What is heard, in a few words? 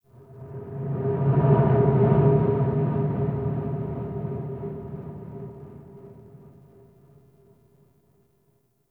Wind Blow; Warframe; Tau